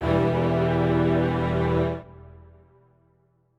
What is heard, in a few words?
strings